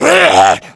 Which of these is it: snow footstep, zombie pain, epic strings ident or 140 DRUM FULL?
zombie pain